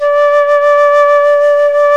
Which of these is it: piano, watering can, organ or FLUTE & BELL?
FLUTE & BELL